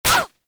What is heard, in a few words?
Player Hit Noise; Warframe; Caliber Chicks Minigame